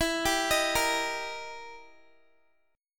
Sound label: E Minor Major 7th Flat 5th, E, down, Guitar; arpeggiated; JGuitar